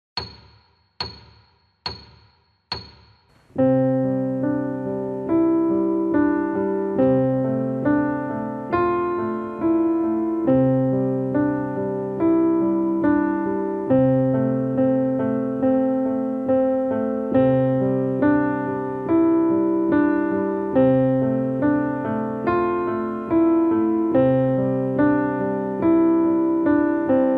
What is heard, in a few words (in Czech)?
vysledek 70 metronom, klavír